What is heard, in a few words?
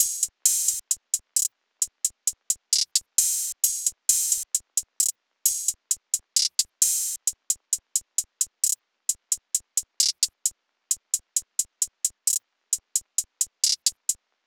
Hihat Loop 132 BPM; Atlanta; Cymatics; Fiji Hihat Loops & Percussion